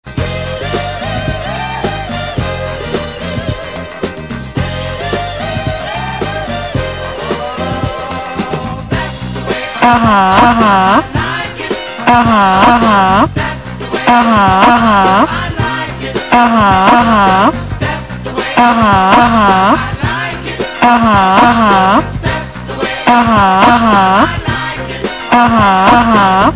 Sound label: Uhhuh